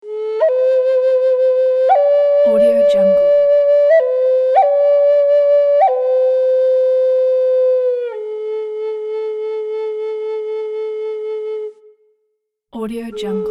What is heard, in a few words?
native american flutes pack